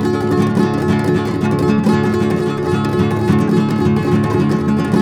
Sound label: D# MJ, Guitars; Spectrasonics; Hans Zimmer Guitars VOL-2; FLAM TREM CH